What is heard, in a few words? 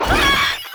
Proto Infested Maggot Attack; Warframe